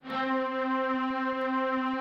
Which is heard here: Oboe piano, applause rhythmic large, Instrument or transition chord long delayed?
Instrument